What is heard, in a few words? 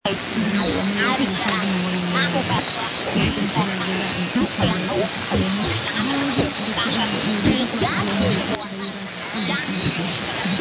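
Saudi Arabia, radio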